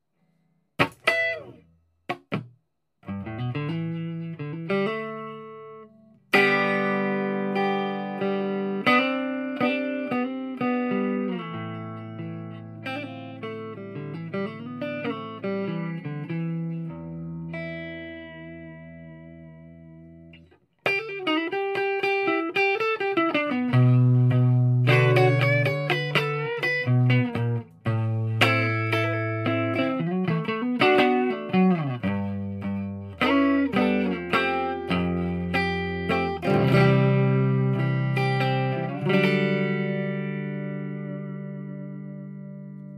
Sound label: srt cln; DIY; 60s ods